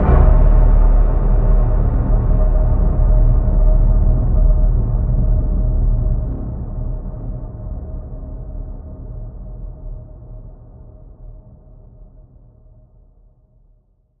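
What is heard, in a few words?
Blast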